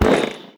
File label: Death; Chess-II-Counterattack